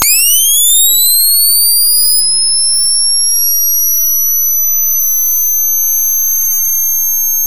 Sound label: nissin 360 noise; flash